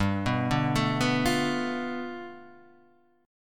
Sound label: G 9th; arpeggiated